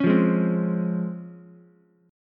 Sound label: C sharp Major 7th C sharp Ukulele; C#M7 strummed; 6,5,4,3; JGuitar